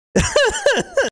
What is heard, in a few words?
ihihihi